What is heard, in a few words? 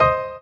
Drop Lead; osu